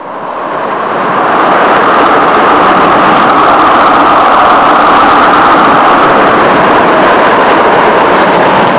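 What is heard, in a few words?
storm hurricane, rain sounds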